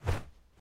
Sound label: Baseball Arm Cloth W; baseball fx & games